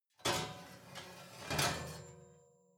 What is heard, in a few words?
oven baking sheet draw out soft